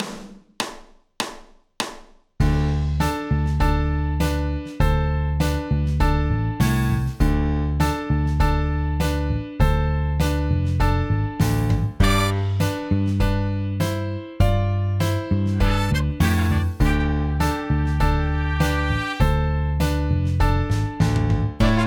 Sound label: Soul Strings Accomp w Piano 100